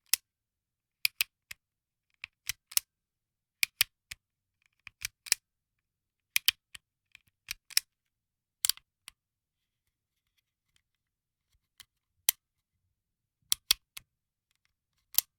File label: handgun hammer click